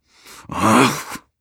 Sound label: hp grunt